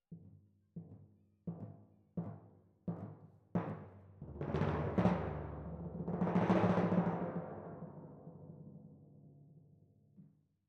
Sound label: Timp Close